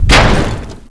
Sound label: garg hit